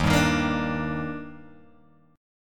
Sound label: Eb Major 7th, Guitar, Open G